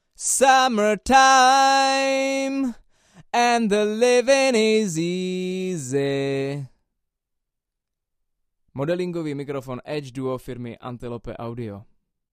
Vocal - C12 BiDirect; bidirectional (osmička); edge duo srovnavaci test

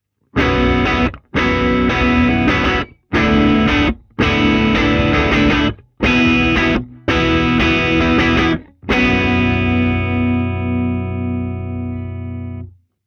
Guitar Box - R121 Bi Direct; Royer Labs R121; bidirectional (osmička); edge duo srovnavaci test

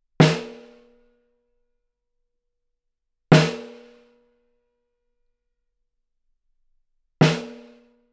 Snare - C12 Omni; omnisphere (koule)

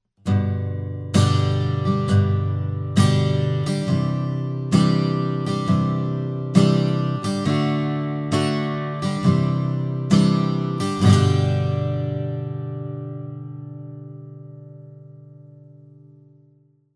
Acc Guitar - R121 Bi Direct; Royer Labs R121; bidirectional (osmička); edge duo srovnavaci test

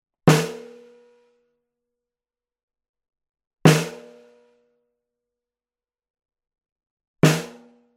Snare - TLM103 Card; Neumann TLM103; cardioid (kardioida); edge duo srovnavaci test

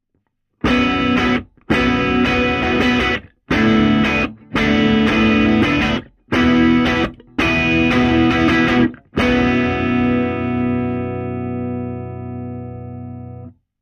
Guitar Box - C414 Omni; edge duo srovnavaci test

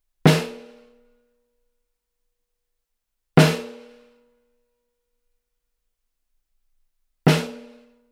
Snare - C12 Omni; edge duo srovnavaci test

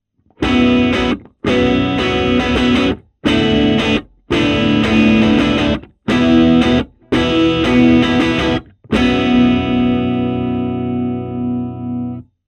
Guitar Box - C414 BiDirect - Edge modelled; bidirectional (osmička); edge duo srovnavaci test

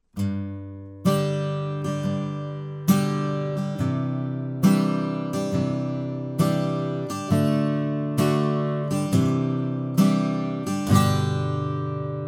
Acc Guitar - C12 Omni - Edge modelled; edge duo srovnavaci test

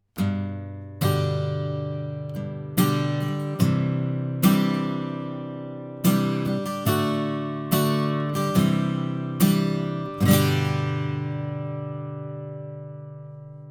Acc Guitar - C414 Omni; edge duo srovnavaci test

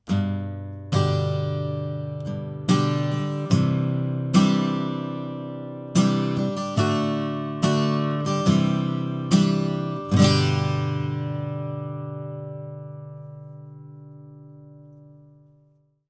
AccGuitar - C414 Omni - Edge modelled; edge duo srovnavaci test

